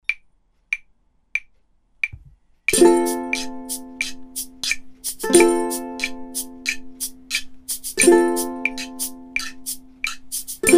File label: Merrily No Vox, ukulele